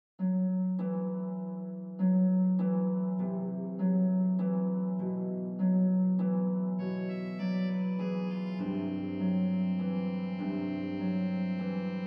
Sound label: accomp F Sax